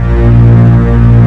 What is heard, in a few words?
ORCH CHORD F1, Strings